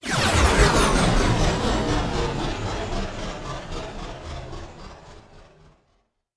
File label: Destroying Ray Whoosh